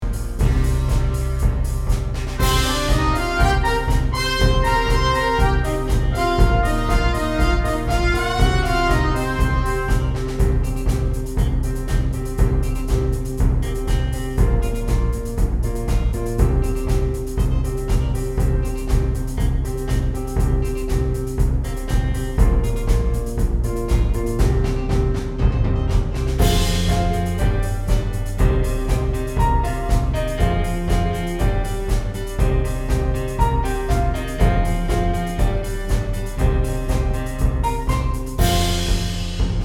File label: backing only